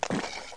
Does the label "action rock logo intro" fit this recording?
No